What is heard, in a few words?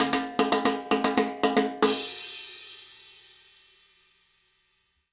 Acoustic Percussion